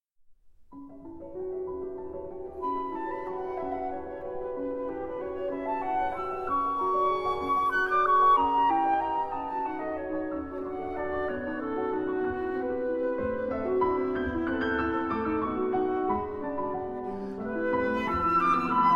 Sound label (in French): Sonatine pour Flûte et Piano, Moderato - Andante Espressivo - Animé